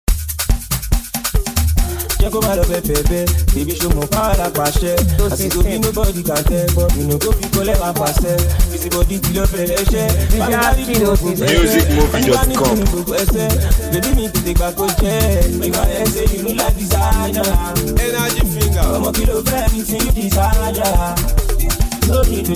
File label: DANCE BEAT